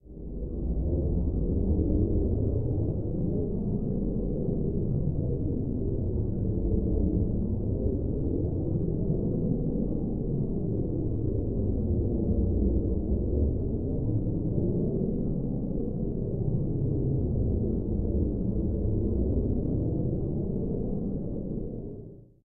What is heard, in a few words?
Small ambient dark; STALZONE